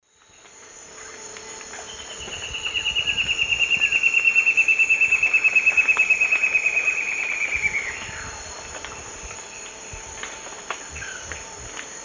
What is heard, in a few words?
Myrmoborus leucophrys, song; S. America; Eastern Lowlands